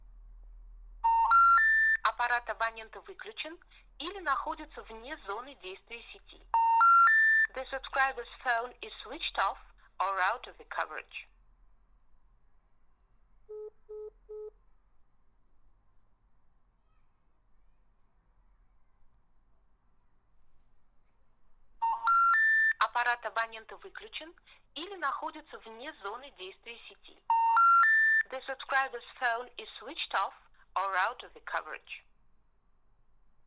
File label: Mobile Answerphone